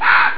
adler; Amiga Soundtracker sample

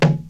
Tanz perc; Crypton - DrumBase5000; AFRICNRHYTHM

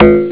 trampolin; ST-XX Amiga Soundtracker Sample Packs

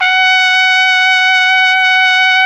TROMPETE F#3, TRUMPET; Best Service; World Colours

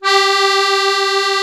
MUSETTE G1, akkordeon; Best Service; Alpine Volksmusik

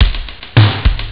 schlagzeug; ST-XX Amiga Soundtracker Sample Packs

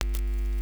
KNISTER; Xsample VOL-9; NOISE

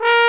POSAUNE; Alpine Volksmusik

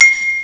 Blech; ST-XX Amiga Soundtracker Sample Packs

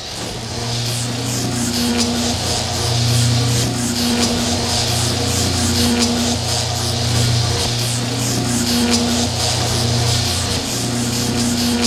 STROM FX; Best Service; Advanced Mega Effects

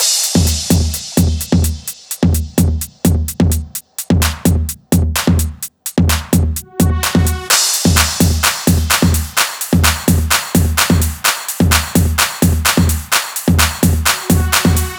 Drum Loop 128BPM Full ohne BD - FX f#

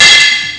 Geschirr; ST-XX Amiga Soundtracker Sample Packs

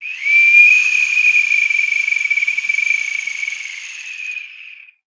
TRILLER, percussion; Best Service ProSamples vol.42

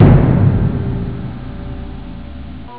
pauke; supremacy; Amiga Soundtracker Sample Packs

